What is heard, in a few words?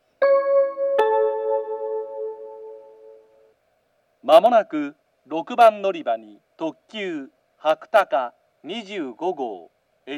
toyama limited express; 特急 / 越後湯沢 / 特急はくたか25号 越後湯沢行 9両編成です。 / 途中、魚津、直江津、十日町の順に止まります。; jr-west